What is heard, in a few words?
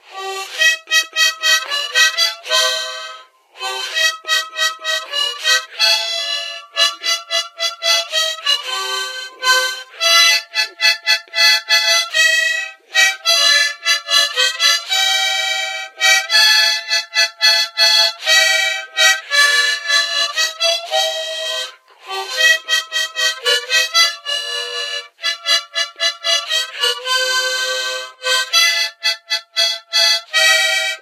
harmonica